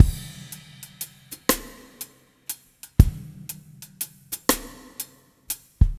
six8 drum 120 rim, Rock Heaven Drums; Quazibeat

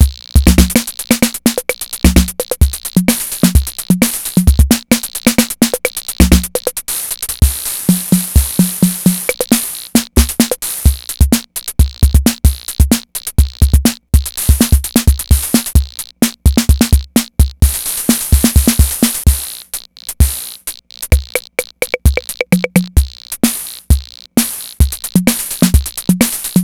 ELI CR-7030

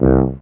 Sht Trpt C3, Fairlight CMI IIe Brass; Brass2

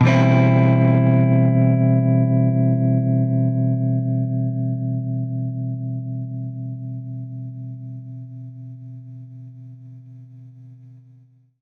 Baritone Chord Eminb6, Guitar Chords; undercover samples

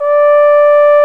Solo T.Bone, Solo Bone; Roland L-CD702

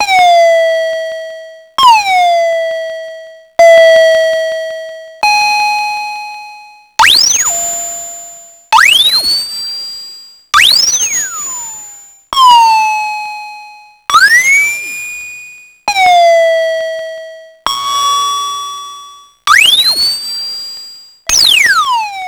Electro-Harmonix Spacedrum

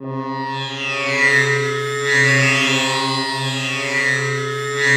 SCREAM G2, Yamaha VP-1; SCREAMSYNTH2; Music Store

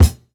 Kick, jungle breaks drum sounds

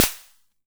SD A; Olson XX-100

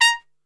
A# 2 X PIC SHT, Brass; Best Service; Brass Super Section

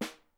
SN, Percussion

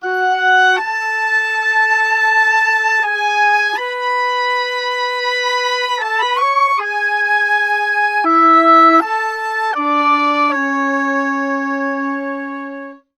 80 woodwinds, F#min, Orchestral arrangements; PowerFX - Downtown Orchestra

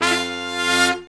BRS SHRT, R&R Horns, Sect; Roland L-CDX-03

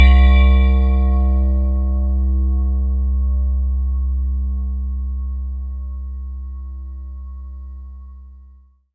C2, Synth Line; NiceBeats

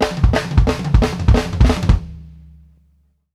FLAM FASTER, drumworks fills; Sampleheads; New York City Drumworks VOL-1; KD FILLS 120

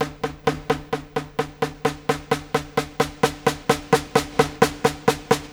Snare, Orchestral, Rock Pop; Sonic Foundry (Sony Creative Software); Orchestral Series VOL-4 - Rock and Pop

